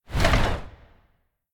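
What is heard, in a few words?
small swing; Smite; Cabrakan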